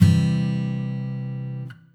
Feel Blob Note; Feel Demos

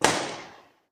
Tactical Rifle; Feel; FeelDemos

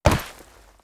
Concrete, Impacts; HapticSamples; Feel; NiceVibrations

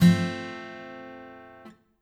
Feel Blob Note; Feel Demos

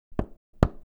Hammer; Feel; NiceVibrations

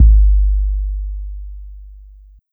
Sequencing Bass; Kit; Feel; MMFeedbacks; SequencingDemo

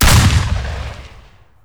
Distance Gun Shot; HapticSamples; Weapons; Feel; NiceVibrations

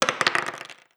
Dice; Feel; NiceVibrations; Haptic Clips Demo

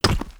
Concrete, Impacts; HapticSamples; Feel; NiceVibrations

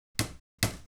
Hammer; Feel; NiceVibrations

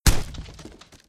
Wood, Impacts; HapticSamples; Feel; NiceVibrations

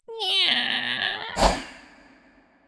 imp defeat; HighGroundRoyaleNetcode

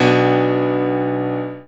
Piano Bass; Snake; Feel

